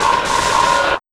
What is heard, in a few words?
Mole Bumpers, Fx